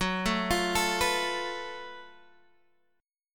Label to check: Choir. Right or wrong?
Wrong